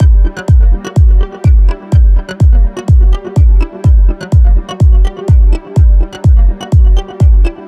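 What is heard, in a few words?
synth loop B flat minor 125 bpm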